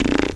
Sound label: lips